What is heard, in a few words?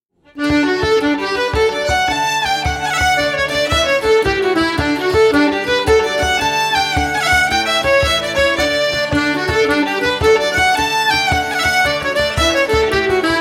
Cape Breton Jig